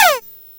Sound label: slide